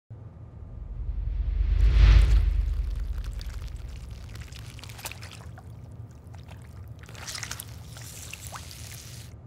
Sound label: sticky; چسبناک